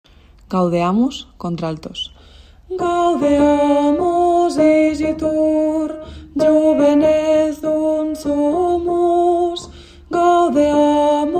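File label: alto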